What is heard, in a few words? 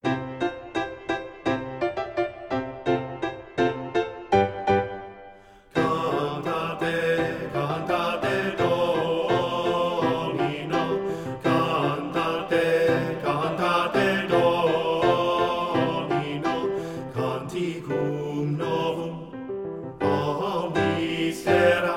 A Classical Cantate TTB (Baritone Dominant)